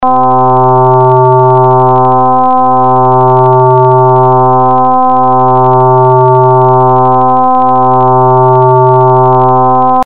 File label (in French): sinus module